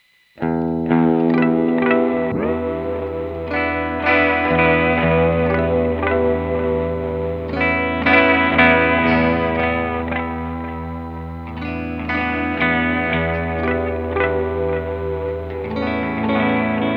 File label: tape delay